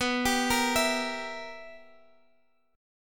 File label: B Major 7th Suspended 4th Sharp 5th B Guitar DADGAD